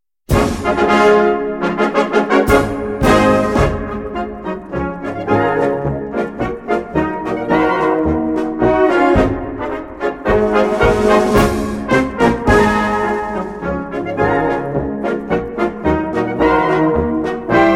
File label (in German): Vulkanland Marsch